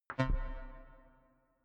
click tonal reverb low

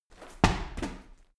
medicine ball wall throw, fitnessstudio

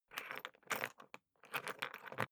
briefcase plastic latch lock key turn ringing; industrie wirtschaft; bueroarbeit

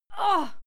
female fight scream pain; schreie; menschen

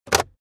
car backseat table pull in cupholder fast

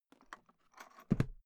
briefcase plastic close metallic rattle; industrie wirtschaft; bueroarbeit

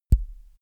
microphone pop on; objekte; elektronik

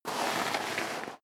step sneaker gravel light slide long; schritte

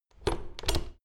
door plastic handle use creak

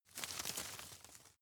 rustle soft; berufe

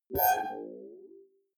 query smooth high, signale

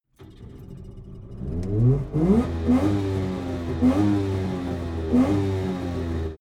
car saab 92 startup blips interior

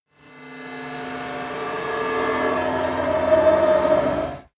ghost choir transition, risers

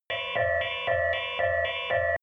sci fi alarm facility; soundpool

